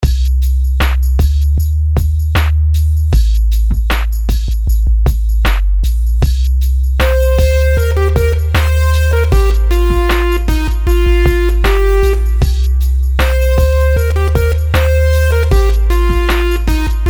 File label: melodies simple 16s Cmin; melody